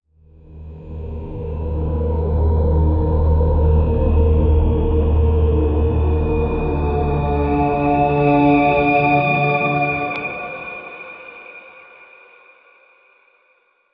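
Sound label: dark epic mysterious gothic; betrayal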